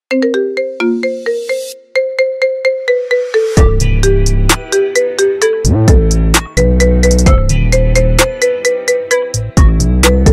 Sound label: Marimba